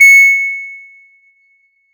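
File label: fantasy pickup 90s electronic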